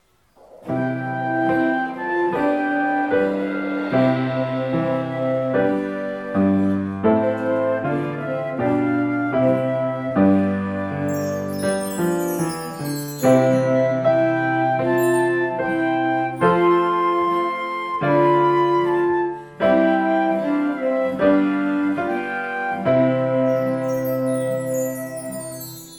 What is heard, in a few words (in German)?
big band alle jahre wieder